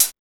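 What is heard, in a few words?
hi-hat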